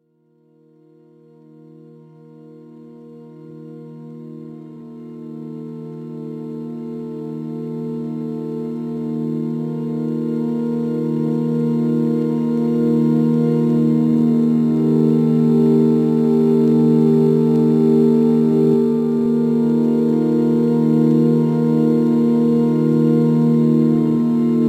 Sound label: Sine Waves